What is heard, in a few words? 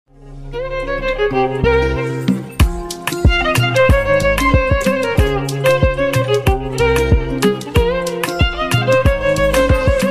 instrumental ringtone love violin ringtones ah ah ah ringtone bgm